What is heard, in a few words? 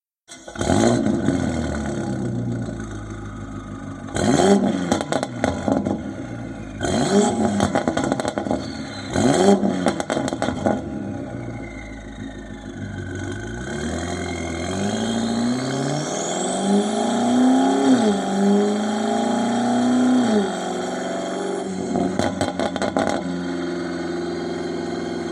BMW X6 M